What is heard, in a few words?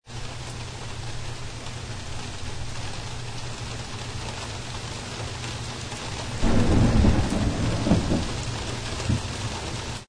T-STORM